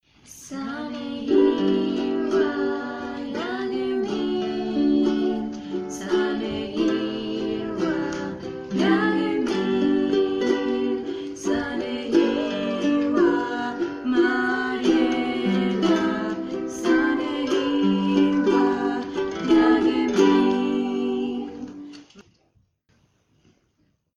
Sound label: happy birthday arabic